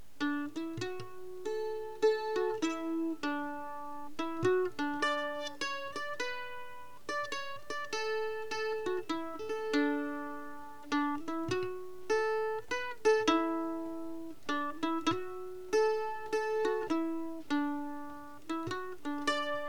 waltz

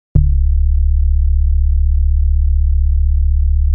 whistle bass level

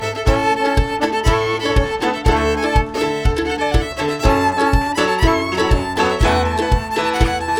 trombone

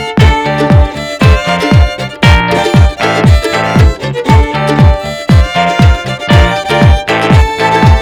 footboard

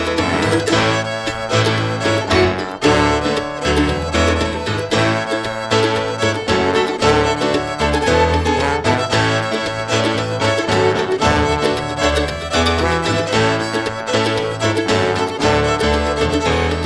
trombone

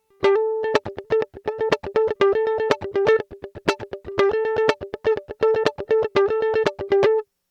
mandolin comparison electric high single

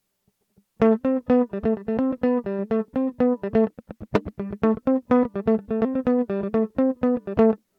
mandolin comparison electric noodles srml

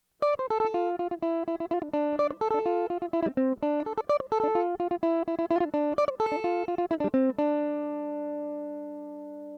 mandolin comparison electric srml

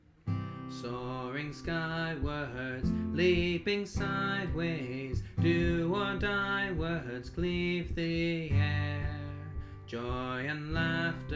unison in harmony; joy-to-the-world

